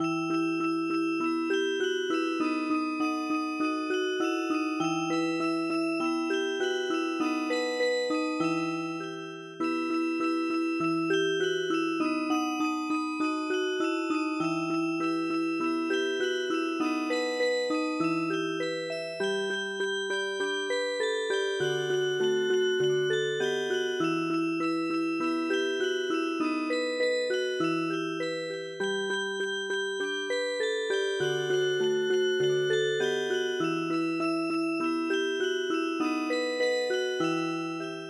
Music Box, traditional

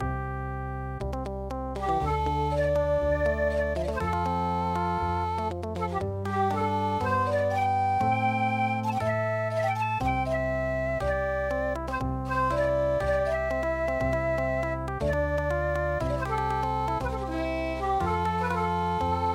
okinawa, midi

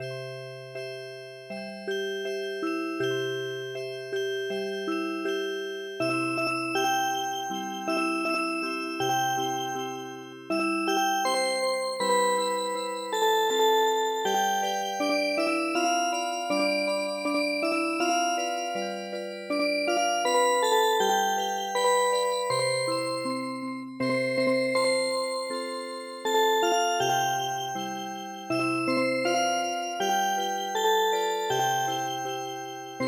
Wiegenlied Music Box, midi traditional

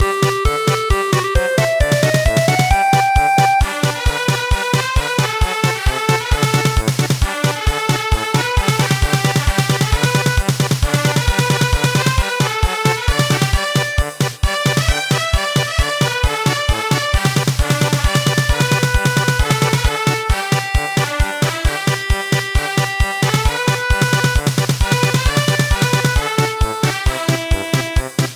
battleship march; 敵対するイメージ; 会社の上司、怖い奥さんなど、厄介な相手をイメージさせる音楽です。; アラーム音; 軍艦行進曲

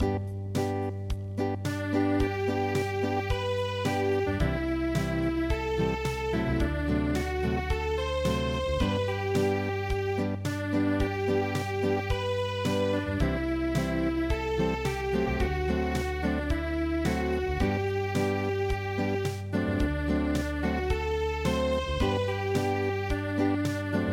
Acoustic; foggy foggy dew

